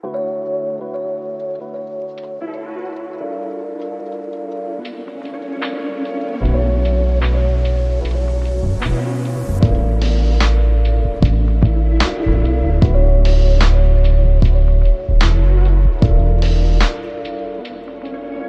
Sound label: beats